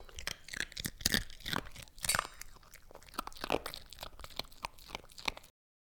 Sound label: Dog eat